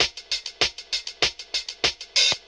hollowed out funk, drum loops

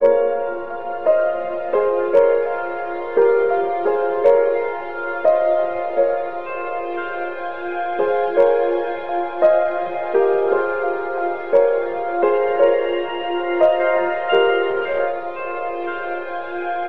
piano, sad loop

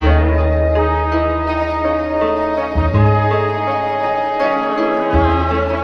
horns, Loops

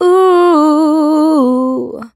"ooh" female SUNG; ooh ahh

